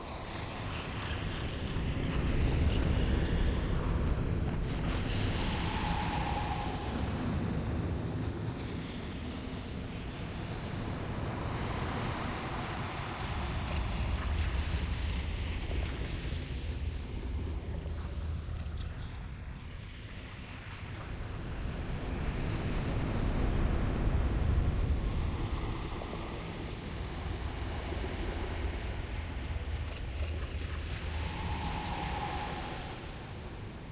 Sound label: menu wallah loop